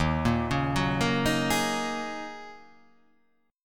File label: D sharp Minor 11th D sharp, chord